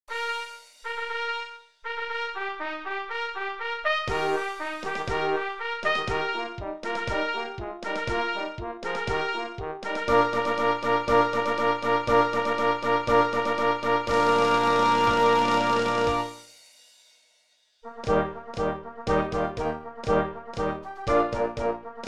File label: William Tell Overture Playback